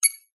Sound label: science fiction computer console beep button press